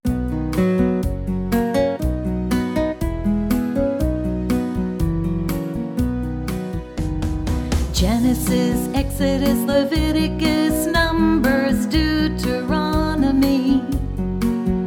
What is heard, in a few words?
the old testament books song